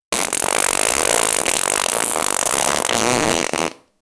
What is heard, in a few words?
farting very loud